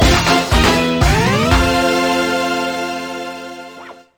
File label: tada; Boulder Attack 2; Wario